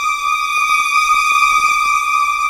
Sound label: music loops submarine danger loop; LEGO Movie Videogame; PlayStation 3